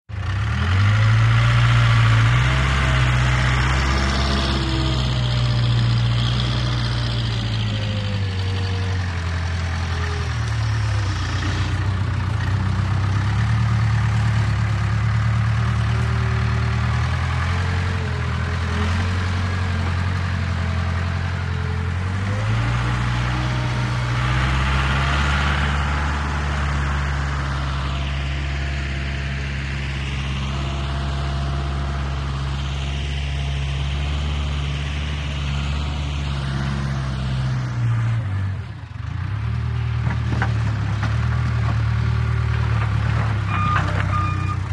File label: VEHICLE - CONSTRUCTION JOHN DEERE 750: EXT: Drive over rough terrain, dump rocks, reverse with beeps.; 750 Dozer